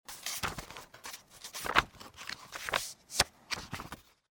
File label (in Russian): toroplivoe listanie